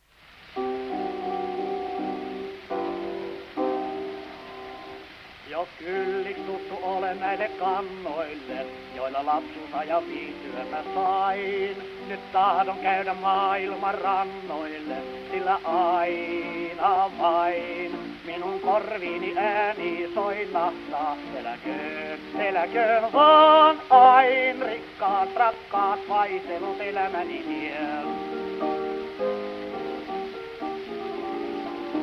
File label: Gramophone